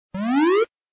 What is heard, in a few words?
GAMBLE WIN; Helena